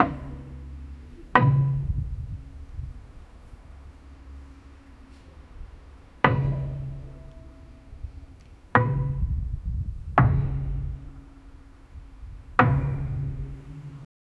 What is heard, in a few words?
the sound of a wooden, church